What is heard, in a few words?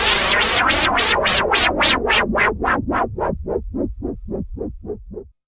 Juno-60, Filtered Noise